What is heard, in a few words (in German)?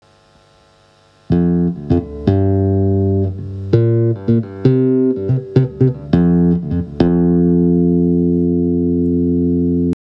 Aufnahme Tapping